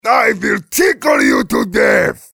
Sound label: tickle; Heavy